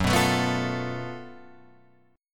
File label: F sharp Minor Major 7th Double Flat 5th, Guitar, Open G